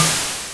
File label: ambient; halloween